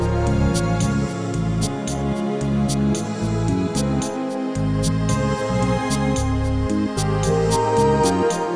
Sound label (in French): mus mo; MOBICLIC